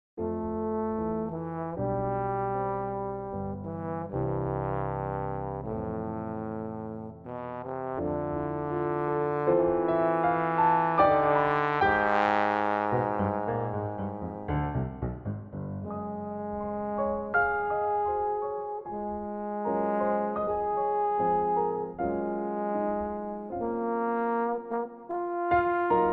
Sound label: Trombone; morceau; Trombones